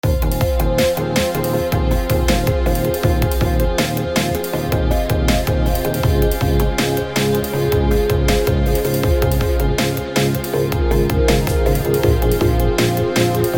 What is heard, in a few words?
General Room Theme Upbeat